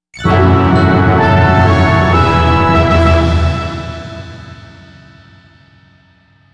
Guit; simpsons hit run; ps2

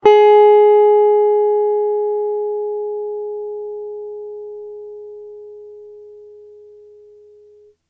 Notes Ab 2; Hylics

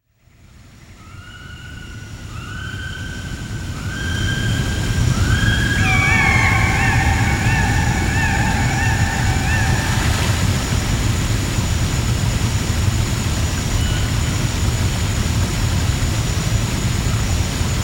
River Ambience; Pitfall The Mayan Adventure

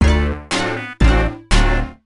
Sting Player Down; Ginormica; Monsters vs Aliens; NDS

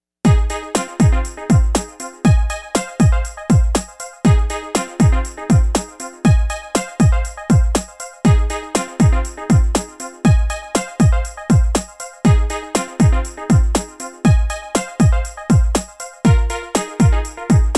Office; Personality Chart Track Only

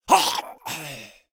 Male Fight Death; Mighty Party; Android

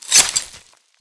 Knife Stab

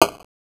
Chop; Five Nights at Freddy's The First Generation; Scavengers Chop2

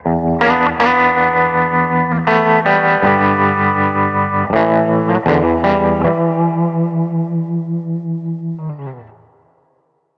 complete sting

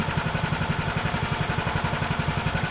Rotator

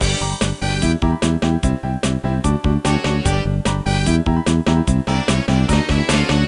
Rock; Petz 5

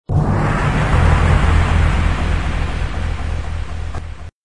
Gong

